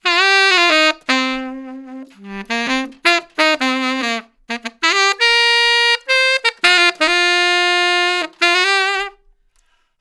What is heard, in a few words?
Tenor One Shot, tenor sax melody; Sonic Foundry; Jazz Solos and Sections; Traditional